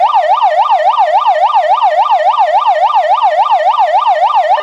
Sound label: yelp; Tomar Electronics